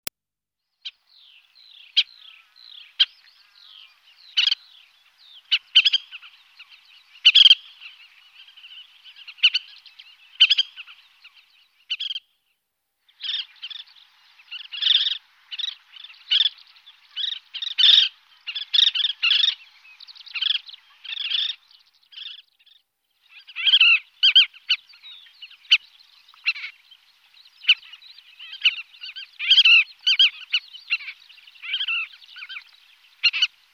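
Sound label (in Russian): lugovaya tirkushka golos, collared pratincole voice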